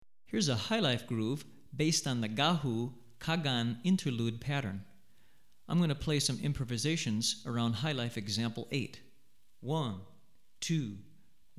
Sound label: Highlife, West African Rhythms For Drumset; Gahu; Hartigan